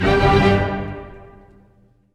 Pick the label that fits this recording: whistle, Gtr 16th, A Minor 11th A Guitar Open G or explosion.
Gtr 16th